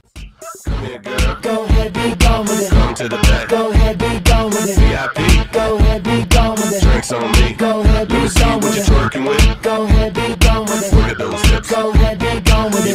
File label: English Ringtone